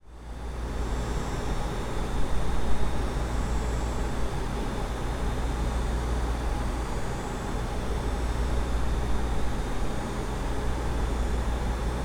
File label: AMB INT Industrial Building Server Room; TS Sound; Savannah, GA Ambiences